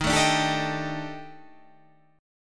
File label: D sharp Major 7th Flat 5th; 23,24,24,24,21,22,21,x; chordshape